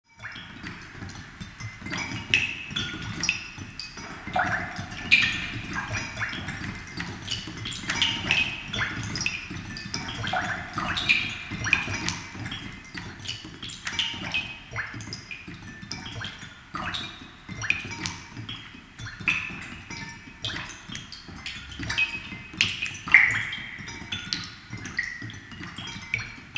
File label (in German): tropfen